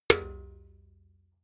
metal bucket hit

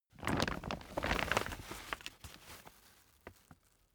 camping chair pack up

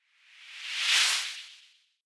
whoosh simple flutter phase high

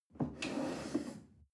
chair wood take slide soft

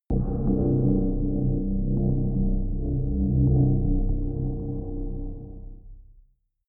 rumble synth

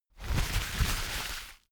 paper towel roll sheet crumple fast

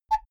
typewriter single

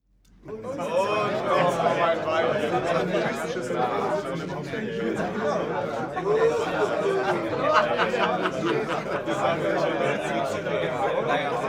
male crowd happy short

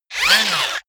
robot movement fast short

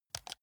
console controller option press fast

